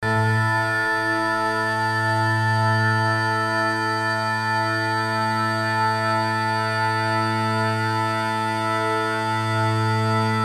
Pipers Drone

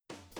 Drums stems; 8 channel

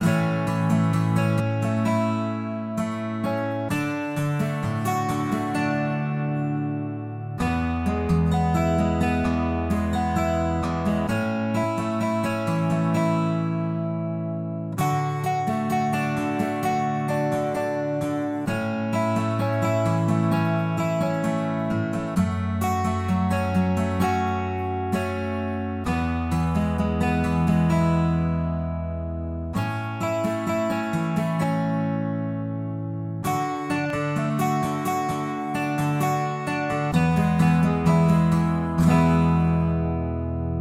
Guitar Acoustic Hotel Style 130

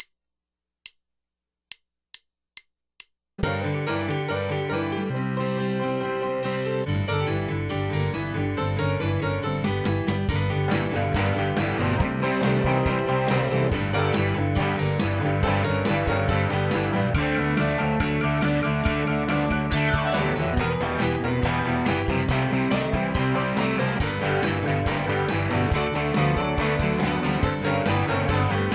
Piano Rhythm Country Boogie

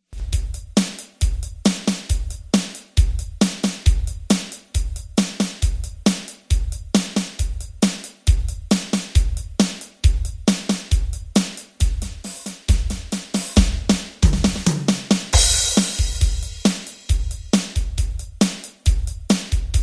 Mersey beat Stereo Audio

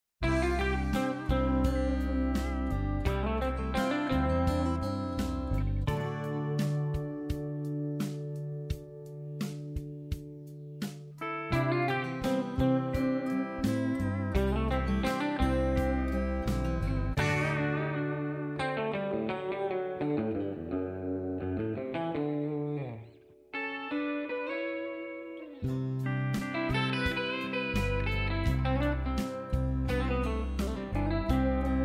Layering guitars

